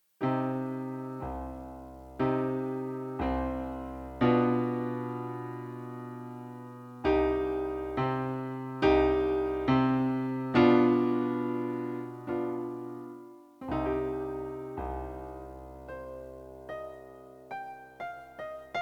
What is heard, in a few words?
piano; House of Horrors